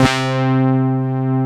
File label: PPG, Analog; USB Soundscan vol.49; Analog Fantasies; AKAI